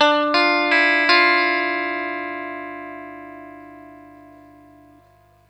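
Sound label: CRISTAL, guitar; USB Soundscan vol.31; Miro s Grooves; AKAI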